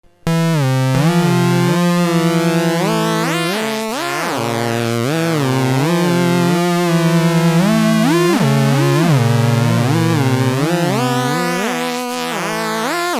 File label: Sad SID Wave